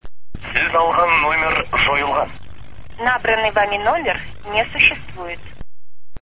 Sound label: kazakhstan, international telephone sounds